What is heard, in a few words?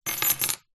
door keys small bunch plastic fob set down table; zapsplat; foley